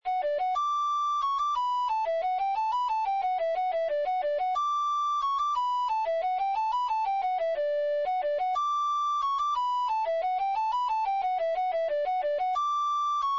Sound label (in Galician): muinheira, Gaita Galega; muinheira de bico fendido; muineiras